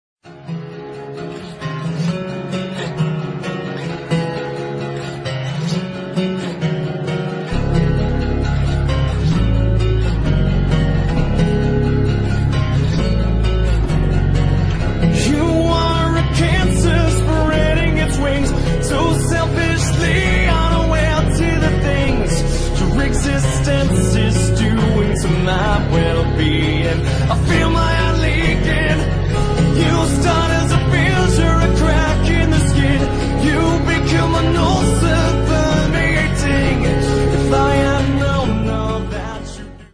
acoustic

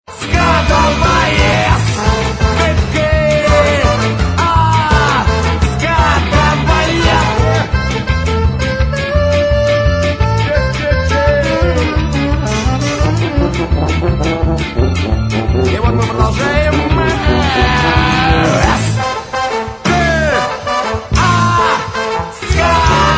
Electro Dubstep

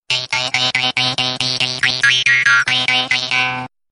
Sms

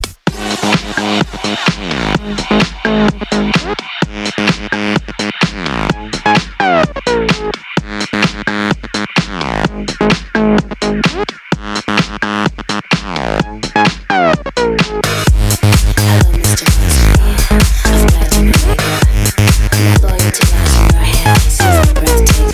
Electro

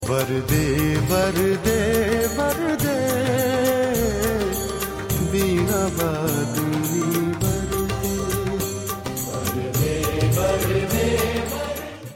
bhajan

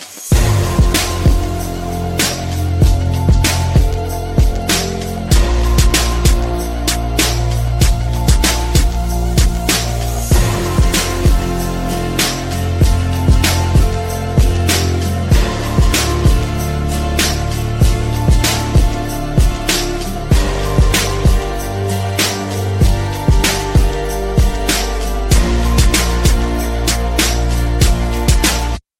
ringtone

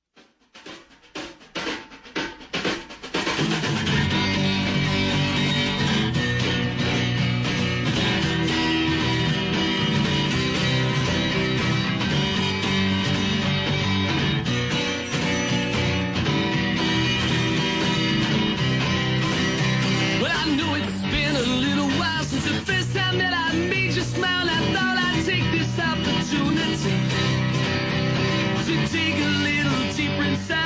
acoustic

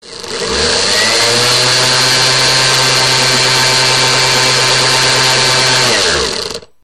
cutting

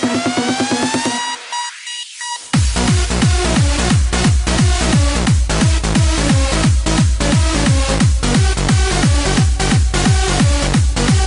Ringtone

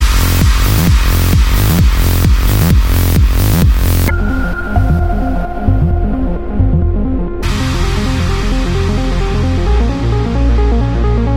trance ring

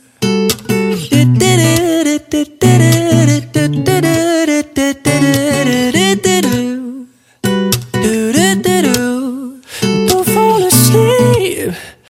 Acoustic

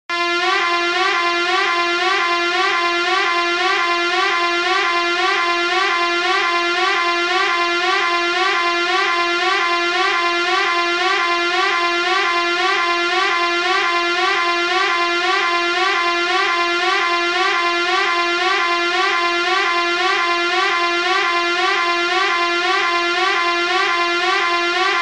SIREN; NOSTROMO

